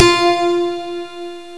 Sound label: piano